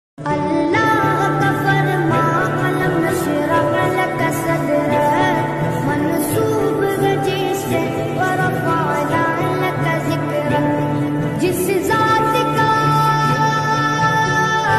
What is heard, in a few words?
allah ka farman alam wo mera nabi hai new naat ringtone